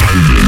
Growl

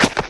normal hit whistle

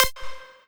lead

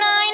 vocal

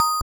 BELL

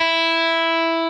Arp.

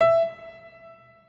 Piano E4

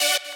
saw

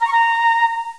pipe

